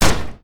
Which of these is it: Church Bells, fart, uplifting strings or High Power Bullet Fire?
High Power Bullet Fire